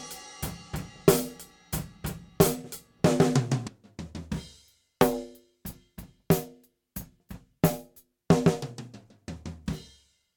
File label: Gate Snare; Drum Recording Setup